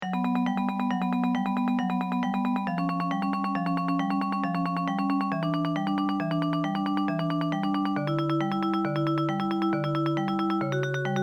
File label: tremolo pieces tremolo at midnight